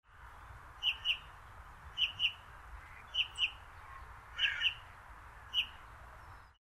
bullfinch; Springside-Dreghorn disused railway line, Ayrshire, Scotland; Soft, quiet calls from two females and a male

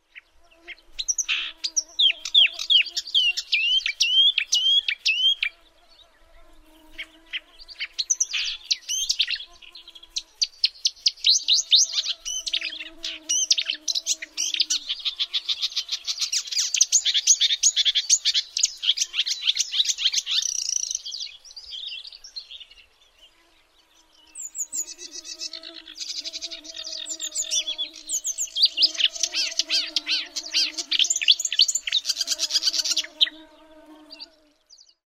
marsh warbler

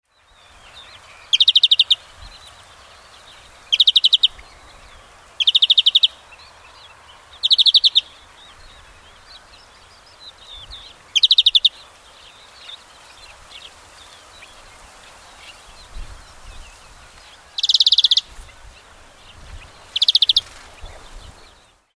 greenfinch

